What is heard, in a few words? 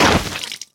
zomb body impact; kfsounds; css